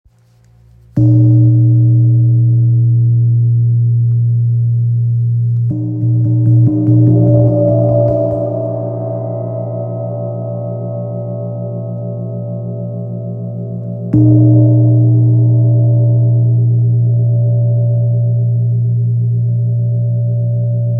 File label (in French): Chau gong 60cm la diese